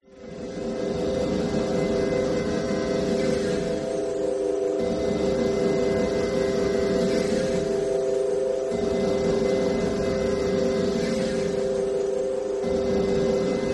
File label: Designed Mecha Sequences Ambience; Gregor Quendel